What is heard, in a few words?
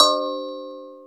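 R8 percussion; X-Static Goldmine 1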